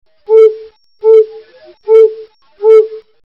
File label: Ring Owl Hoot